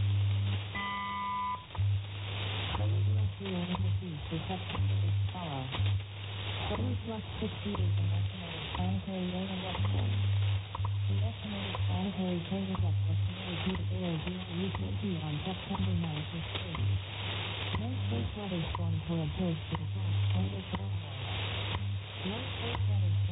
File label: WWVH WWV